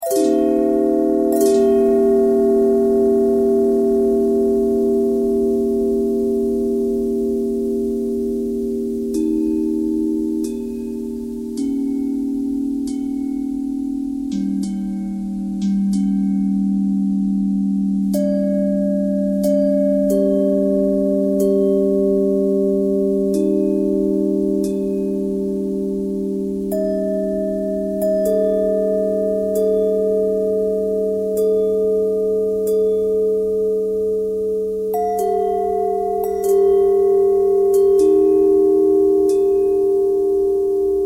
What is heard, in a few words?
bell bell thread noise, bells